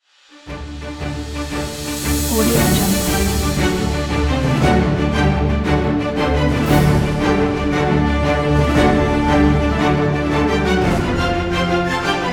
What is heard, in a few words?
the open ocean pirate orchestral theme; apd-sound